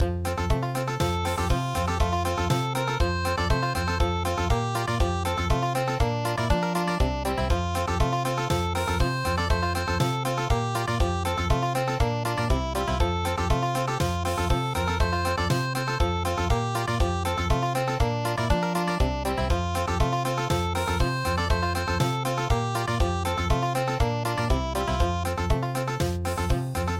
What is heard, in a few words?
the cowboys lament country